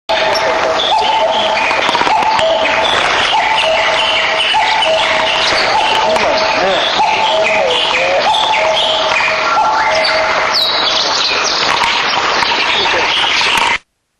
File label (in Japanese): カッコウ, 戸隠